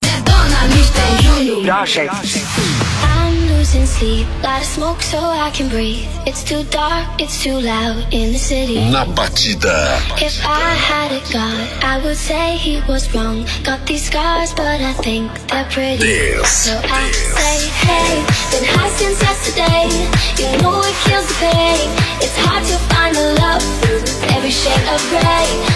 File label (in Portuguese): Batida Dance